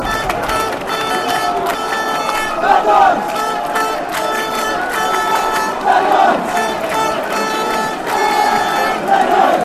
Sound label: horn; dons